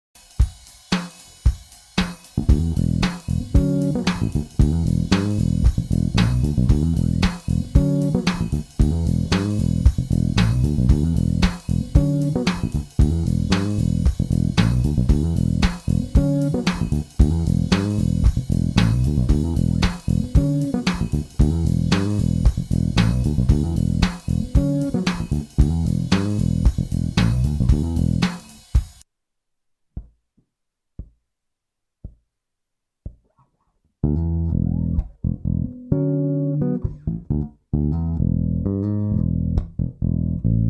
The chord

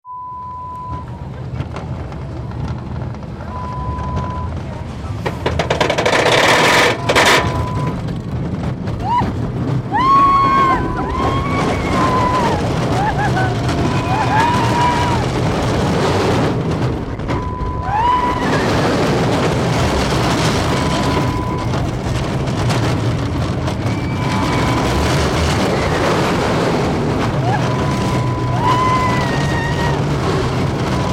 Rollercoaster

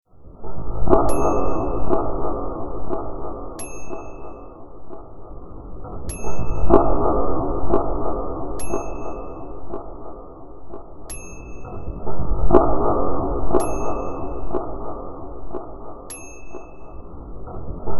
Space Sounds

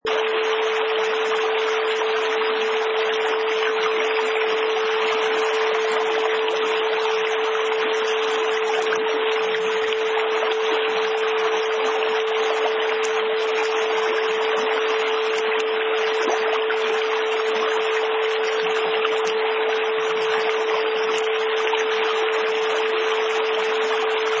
Brook High Pitch

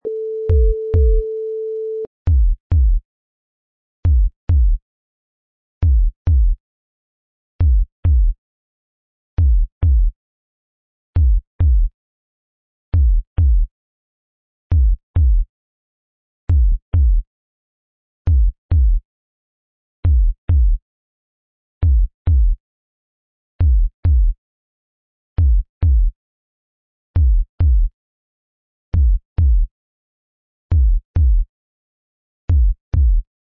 Evil Bass Beat

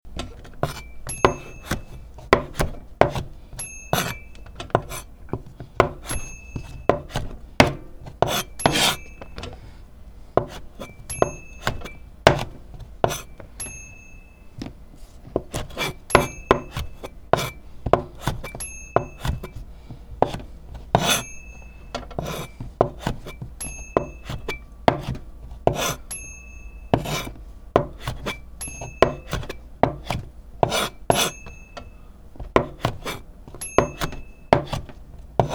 Chef Cutting Vegetables

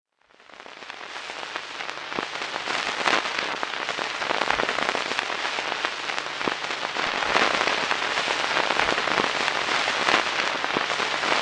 old film optical noise

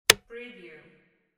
coffee maker switch

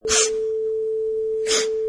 Two Sniffs

